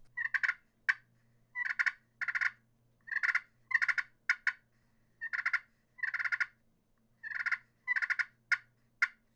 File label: clear crystal yellowheart purrs clucks, turkey; Southland Sounds